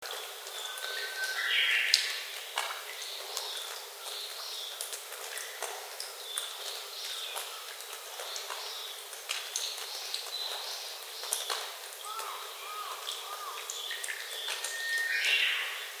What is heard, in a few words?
tunnel; oume